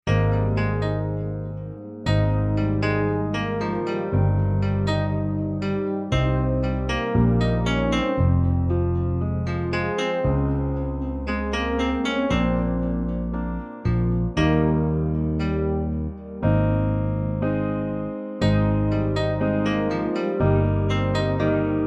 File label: (Ab) No Backing Vocals